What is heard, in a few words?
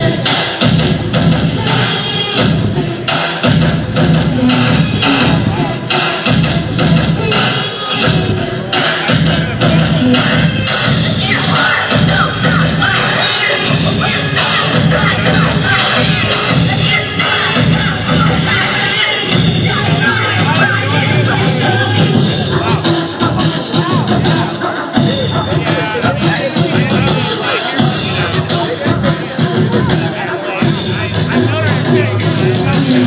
sleigh bells